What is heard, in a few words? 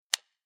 click